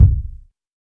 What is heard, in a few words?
round bass drum sample c key